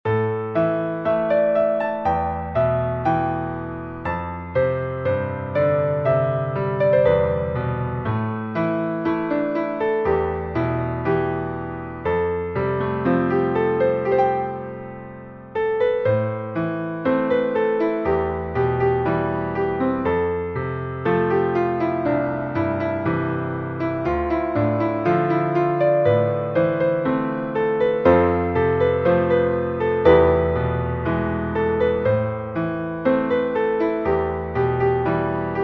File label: piano